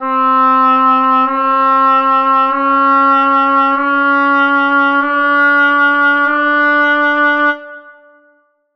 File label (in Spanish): Quintos de tono